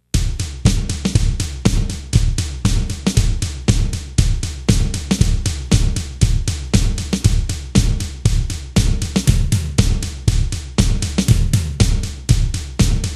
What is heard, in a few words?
Drums